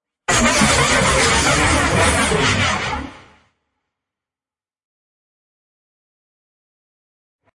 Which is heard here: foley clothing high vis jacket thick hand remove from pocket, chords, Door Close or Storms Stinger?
Storms Stinger